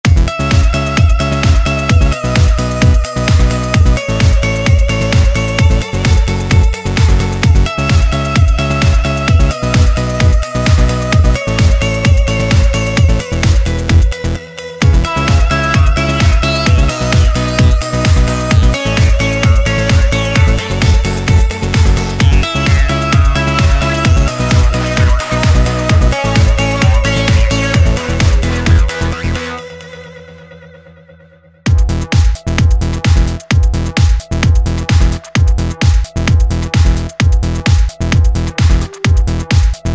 Electronic Piano

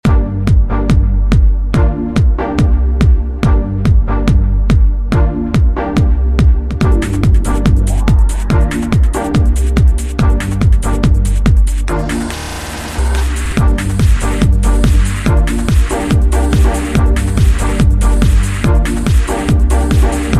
Trance; Bluezone; Trance Traxx

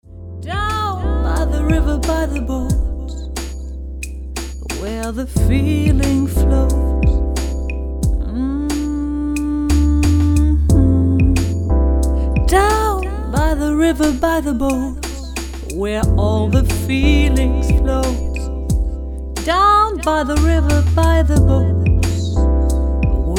Vocal; Vocal Attack

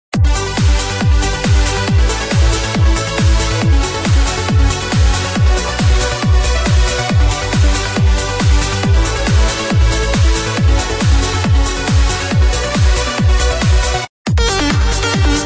TRANCE; SUPERCHARGED; SONGSTARTERS

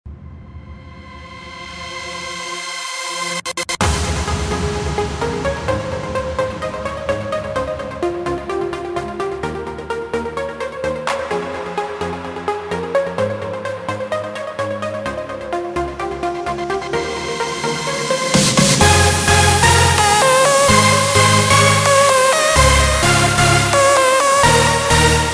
heavy house kits

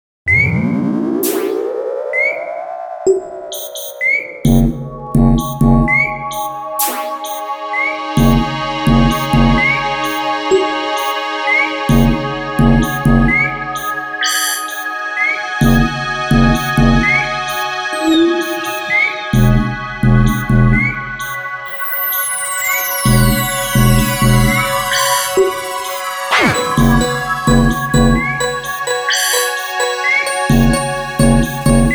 One Shot Hit; Cerebral

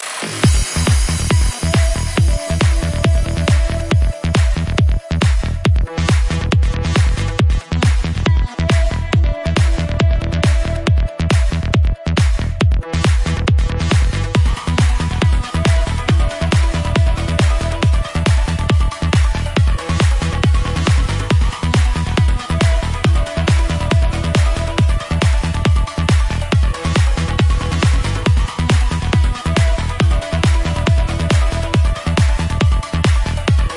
progressive psy kits; DMS

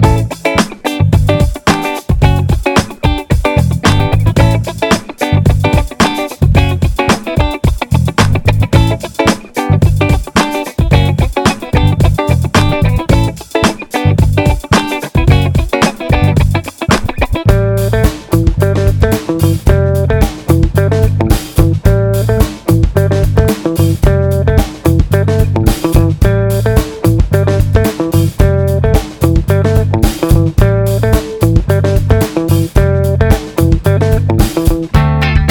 Funk Guitars

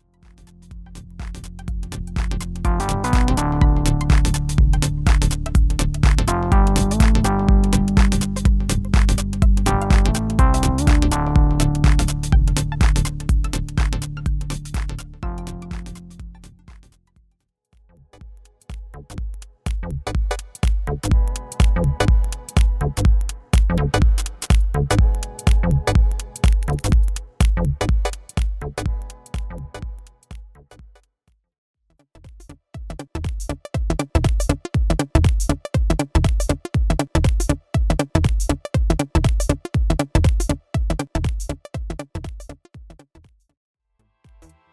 Deep Tech House

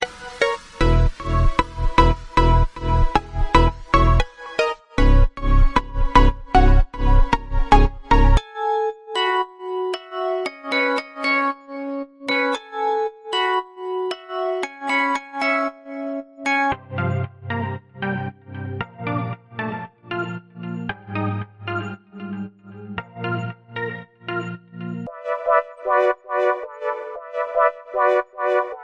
summer melodies progressions

